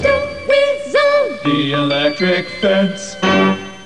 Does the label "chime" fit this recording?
No